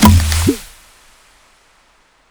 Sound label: Shoot